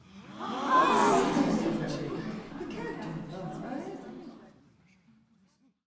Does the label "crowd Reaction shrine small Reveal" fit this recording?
Yes